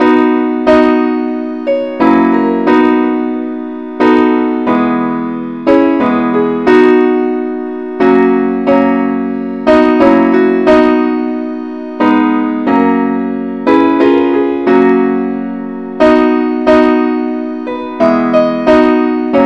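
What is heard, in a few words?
simple accompaniment key B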